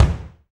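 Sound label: bass drum, Real Kit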